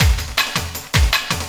Loop, Drum & Bass, 160 BPM

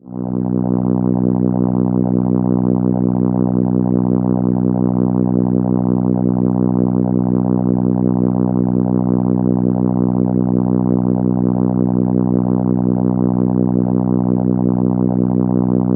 Contra B3, ARP Solina

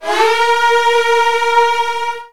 Virtuoso Strings A#2; ILIO; Kirk Hunter Virtuoso Strings VOL-2

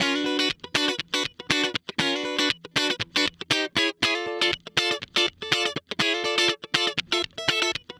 Guitar Licks

